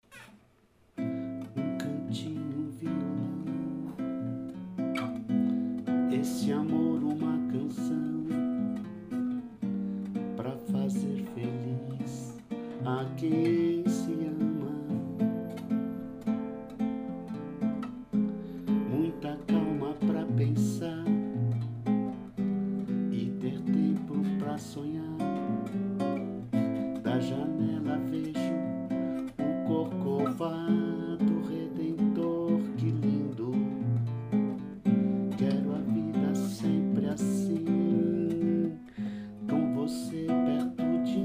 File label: bossa nova